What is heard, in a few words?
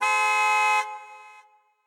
Carhorn Carhorn; gamerip; The Henry Stickmin Collection